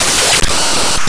Rhythm

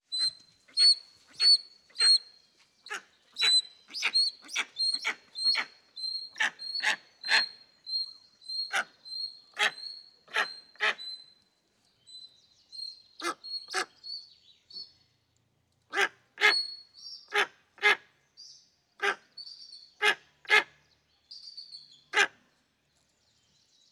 Duet Bald Eagle & Tawny Eagle - Calls, Squawk Cry; Neumann MF; Soundmind; Predatory Birds

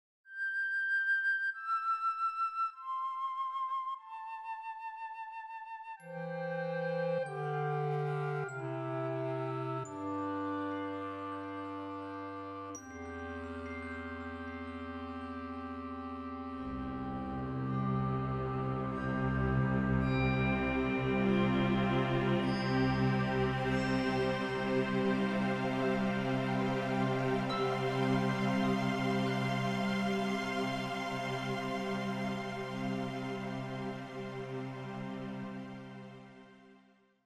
Sleep and Then, Leadings and Stings

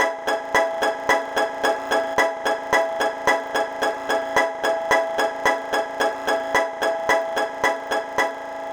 Loop Emaj 110 bpm; 99Sounds; Mangling Audio; Mangled Software; Midiception

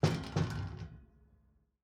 Exterior Metal Door Gate Close, Rattle; 344 Audio; Practical Doors

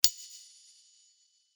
Sword Sound; FXHome; Fighting Sounds

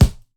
Punching Percussion, Stick Hit, raw; 99Sounds

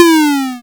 lose; Kenney's Sound Pack; Retro Sounds 2

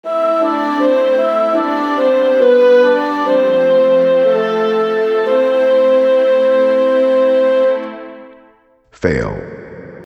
Game Over

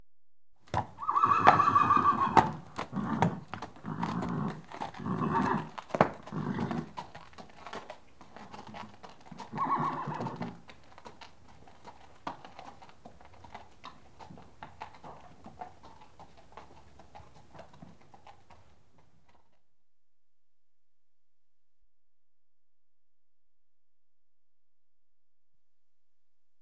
Horse lead out of stable, others; Horses; BBC Sound Effects Library

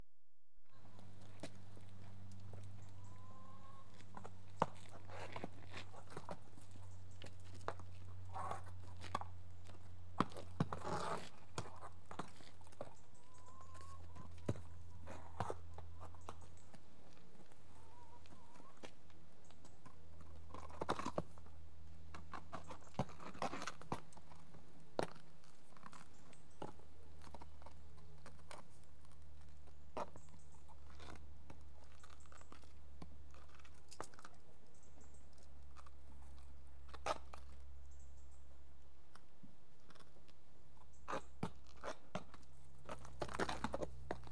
One restless horse on concrete; Horses; BBC Sound Effects Library

